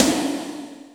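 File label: Snare Fringe, Alesis D4 drum machine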